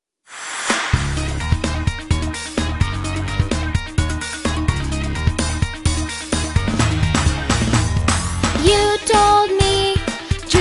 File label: Love Ooh Ooh Paradise English